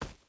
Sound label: footstep hide forest run; Rust